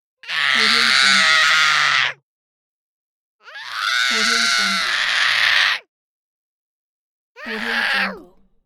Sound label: child screaming horrific screams